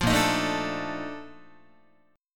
A sharp Major 11th Guitar, chord; strummed

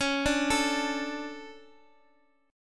D 5th C sharp; D5/C# arpeggiated; JGuitar

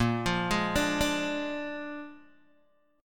A sharp 7th A sharp, Guitar, Open G

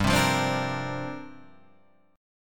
C 7th Suspended 2nd Suspended 4th, G

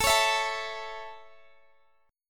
A Major, Guitar DADGAD

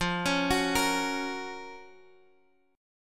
Gb Major F; Listen to Gb/F arpeggiated

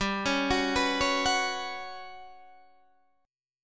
G Major 7th Flat 5th, Guitar, Open D; down

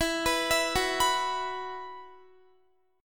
E Suspended 2nd E, Guitar, 4E,4A,5D,5G,5C,6F; Esus2; arpeggiated

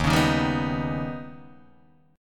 Eb Augmented 7th, Guitar, Open D

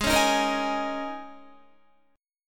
Ab Suspended 2nd Suspended 4th Ab down Guitar; strummed; Absus2sus4

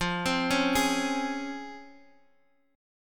Db Major 7th

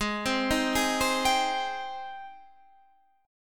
Ab Major 7th Ab down Guitar Half Step Down

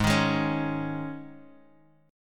G sharp Suspended 4th; strummed; 16,18,18,18

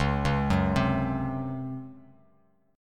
Db Major 7th Suspended 4th Db down Bass Standard; arpeggiated; JGuitar

